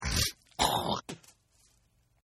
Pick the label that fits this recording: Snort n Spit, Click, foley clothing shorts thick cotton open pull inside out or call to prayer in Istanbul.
Snort n Spit